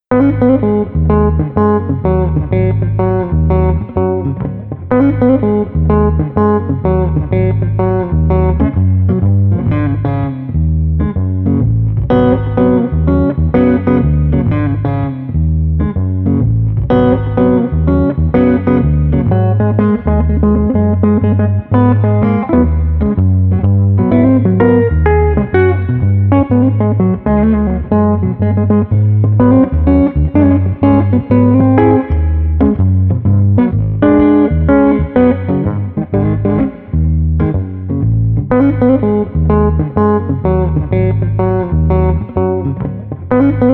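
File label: Street Guitar Bass Walking Groove, 100 bpm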